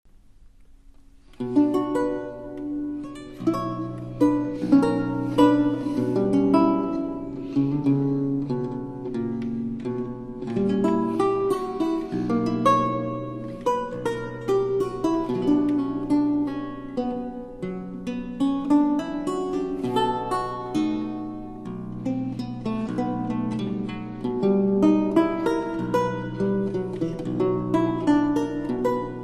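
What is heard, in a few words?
bach sarabanda c minor, laute; cmoll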